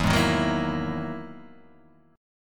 E-Major Sharp 11th E, Guitar Half Step Down

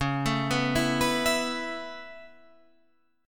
Db Minor 7th Sharp 5th Db down Guitar Standard; arpeggiated; JGuitar

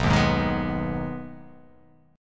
B 6th B down, chord

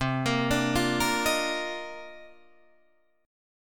C Minor 11th C Guitar Drop D

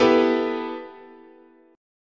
B 7th Suspended 4th Sharp 5th, chord, guitar

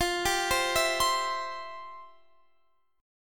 C Major F; C/F; arpeggiated

